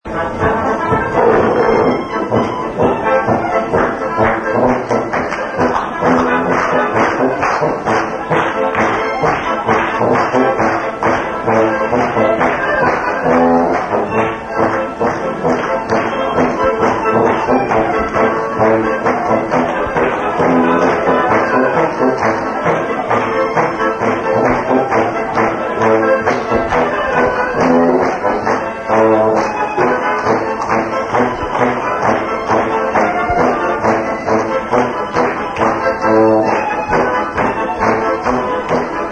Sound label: polka; polka dai de gas